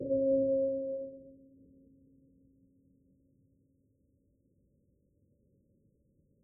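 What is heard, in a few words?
bell hum prime; cccbr; glossop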